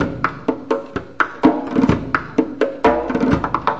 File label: 126 bpm, mideast kinda latin, drum loops and breaks